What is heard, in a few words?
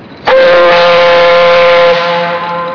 4 org airs